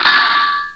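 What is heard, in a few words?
Bullet hit; Demo Man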